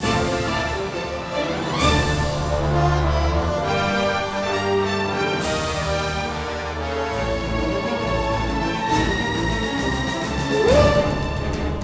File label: Combat; The Hobbit; PS2